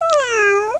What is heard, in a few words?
cat sound